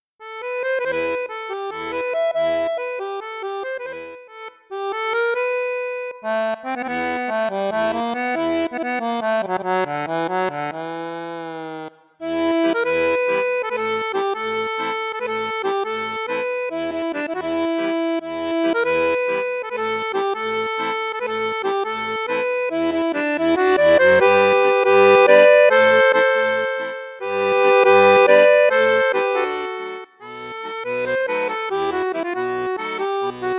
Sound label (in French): registre basson, accordeon; cavagnolo vedette 10; traditionnel